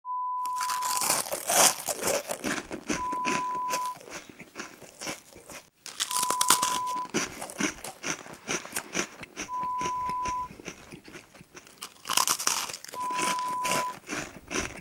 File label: Crunch Chew sound